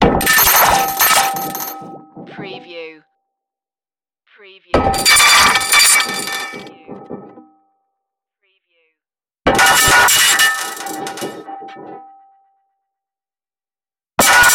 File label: digital core strikes